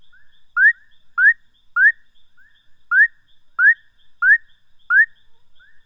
Leptodactylus bufonius; herpetologia; cantos

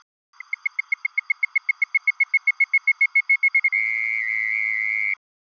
Melanophryniscus cupreuscapularis; herpetologia; cantos

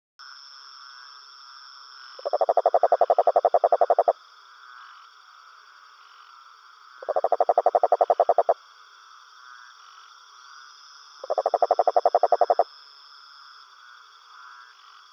Rhinella diptycha; herpetologia; cantos

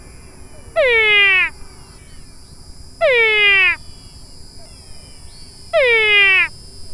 Physalaemus biligonigerus, cantos; herpetologia

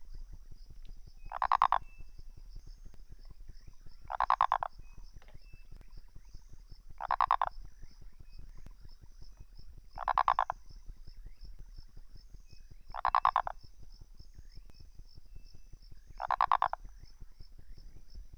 Phyllomedusa sauvagii; herpetologia; cantos

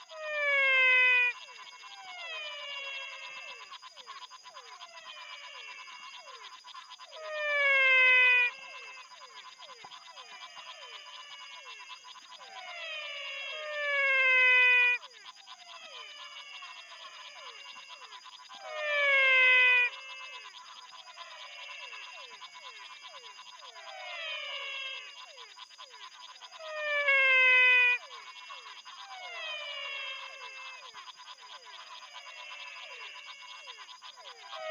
Physalaemus cristinae; herpetologia; cantos

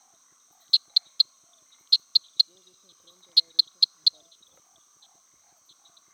Dendropsophus nanus; herpetologia; cantos

